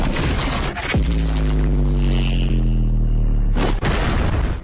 explodido demais

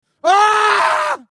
grito masculino

